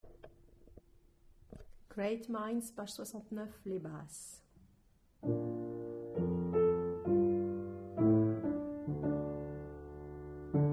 Basse

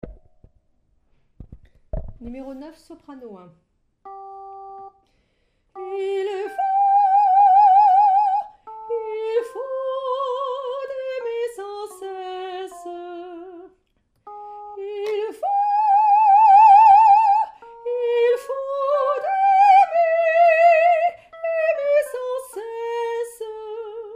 Soprano; pop

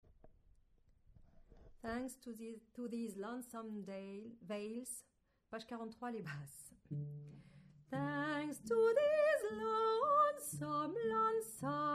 Basse

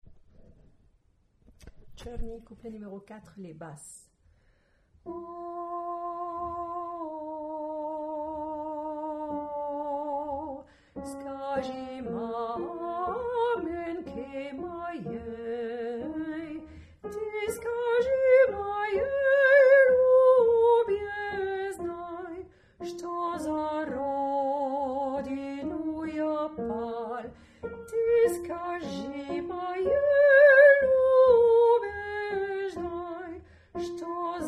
Basse

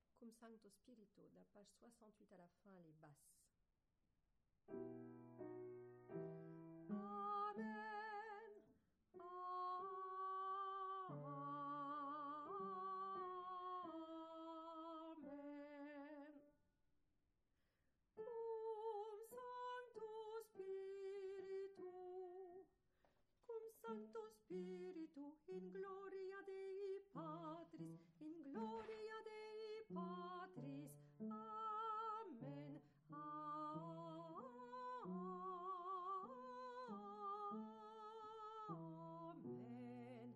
Basse

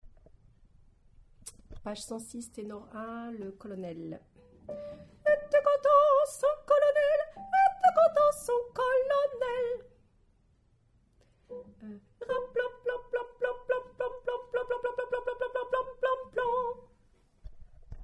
Tenor

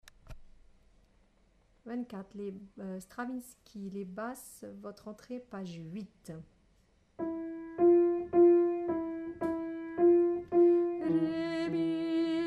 psaume Basse

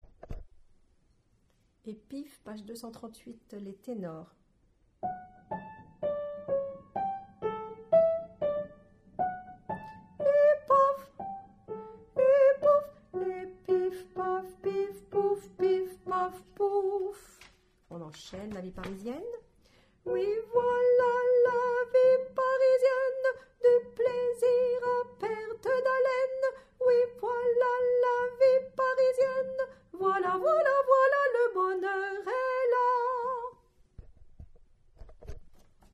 Tenor

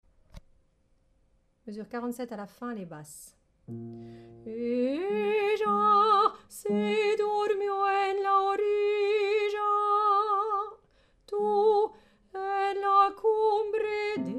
paloma Basse

